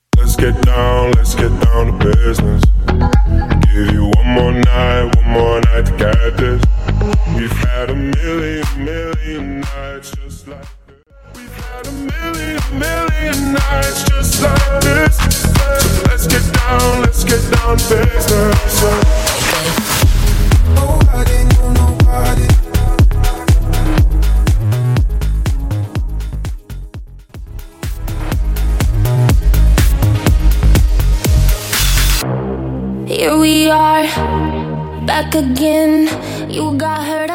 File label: Future House Megamix 120-126; Top 40